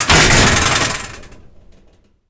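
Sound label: destruction metal locker big; Explosions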